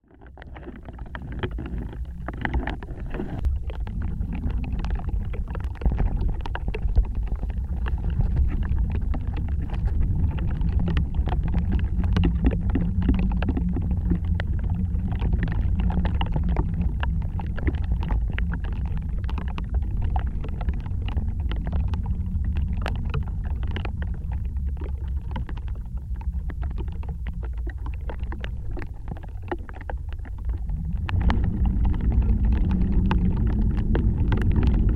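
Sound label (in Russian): контактный микрофон на остролисте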